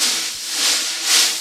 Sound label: BRUSHES, 12-Bit Drums; E-MU Formula 4000 Series Vol. 1; Hip Hop Nation